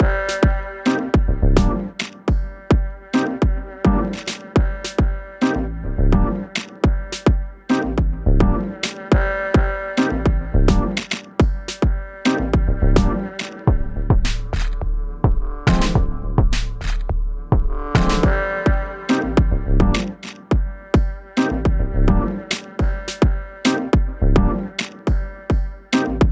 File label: Vintage Vibes